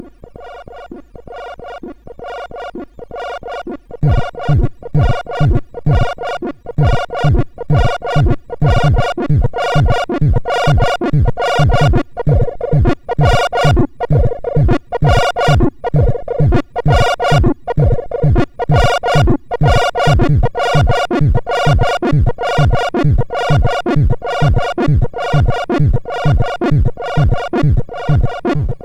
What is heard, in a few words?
sid, MOS6581R2; Underground Beats